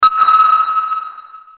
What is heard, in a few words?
Notifier Sonar; Blackberry Bold